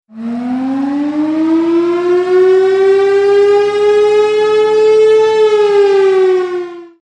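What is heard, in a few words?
Air Attack Siren Warning Military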